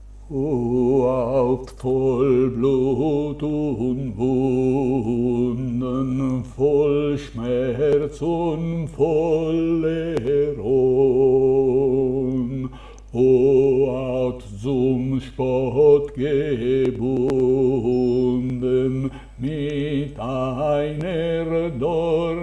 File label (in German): O Haupt voll Blut und Wunden - contralti; Passio